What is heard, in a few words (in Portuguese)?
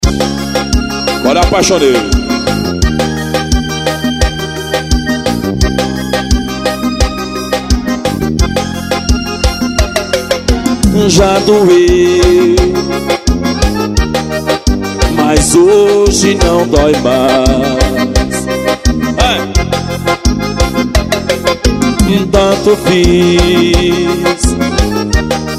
ao vivo na festa; promocional; festa de sao marcelino champagnat